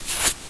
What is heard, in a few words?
brush, shaker